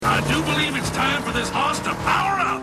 Power Up; X Hoss; Robots In Disguise television show

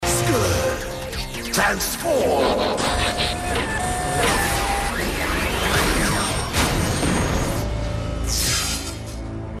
Transform Long; Scourge; Robots In Disguise television show

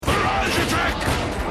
Battle Barrage Attack; Scourge; Robots In Disguise television show

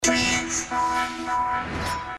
TRANSFORM SWITCH; Robots In Disguise television show

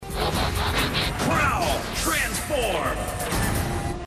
Transform; Prowl; Robots In Disguise television show

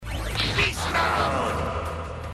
Beast Mode; Darkscream; Robots In Disguise television show

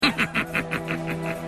Laugh; Scourge; Robots In Disguise television show

